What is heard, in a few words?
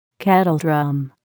kettledrum